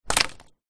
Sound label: handfull of sticks drop hard ground; foley